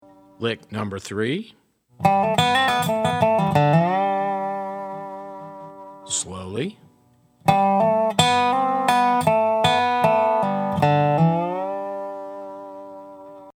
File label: resonator pedal, steel guitar